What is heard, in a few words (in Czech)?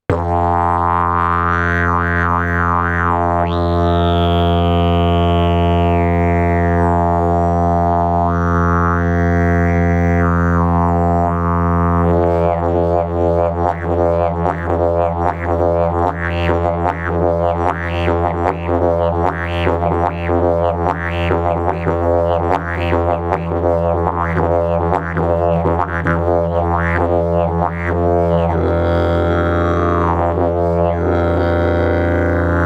olse koncertni, didgeridoo